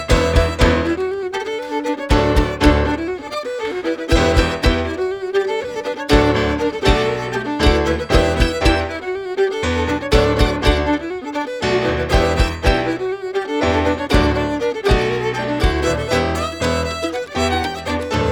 mando chops

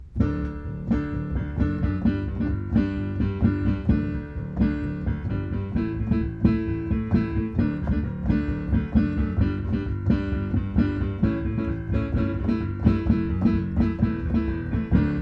rocking octaves both hands

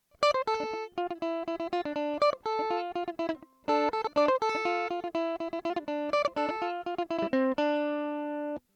mandolin comparison electric di

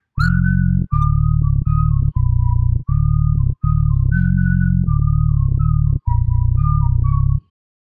bass whistle; The left channel is the bass, while the right is the input whistling.

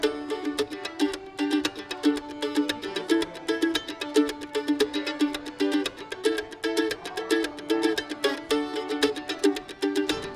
mando

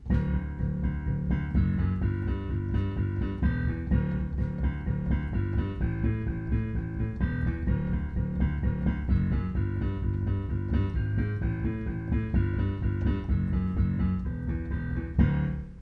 rocking octaves left hand

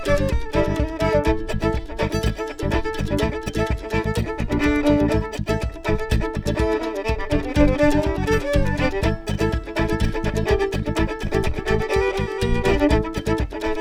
bass whistle percussive

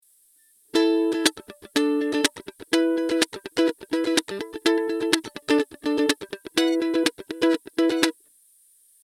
mandolin comparison electric logic chords

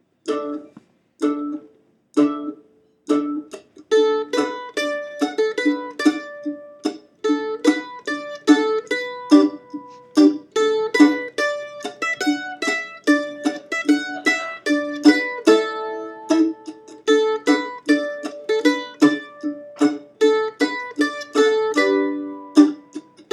chords melody

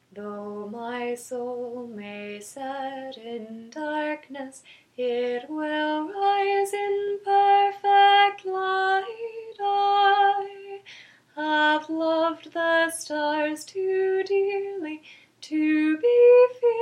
singing, though my soul may rest in darkness

